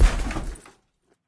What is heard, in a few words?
chestbump; smite; Ares